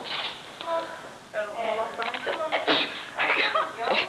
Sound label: sneeze; Haydenville